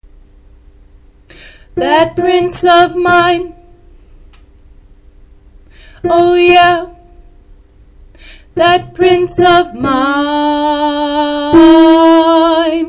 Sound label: that prince of mine altos